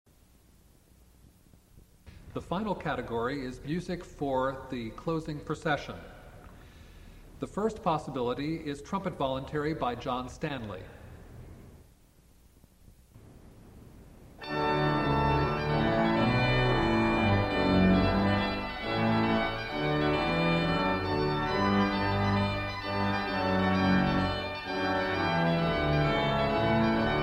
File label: trumpet; stanley